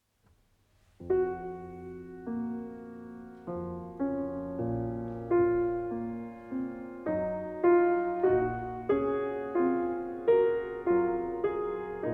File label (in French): Nocturne en ré bémol, piano; Durey; op. 40